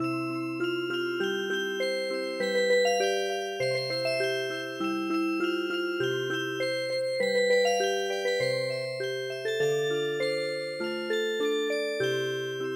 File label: youngman of tirol Music Box